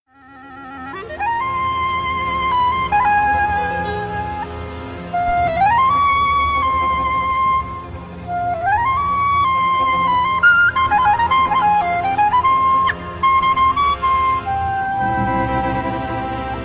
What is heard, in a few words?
Klezmer Band; Budapest